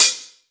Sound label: crispy open hat one shot d key, Royality free open hi hat one shot tuned to the D note